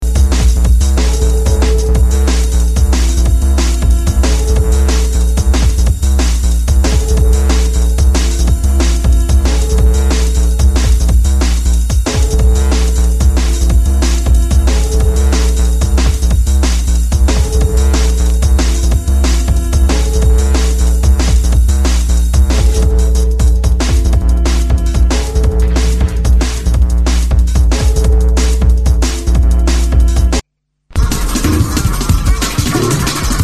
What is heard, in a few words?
rimshot; radiobomb